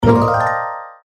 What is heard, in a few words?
bonus; Fairy Dust